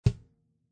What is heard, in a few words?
Deep Stereo Kick; Lounge & Reggae; Loopology; Ska Till Ya Drah